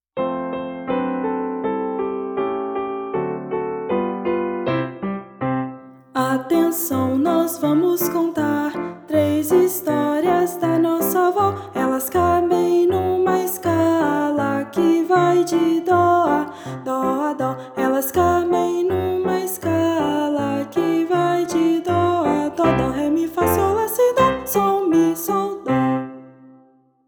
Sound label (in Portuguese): VOZ GUIA; Abertura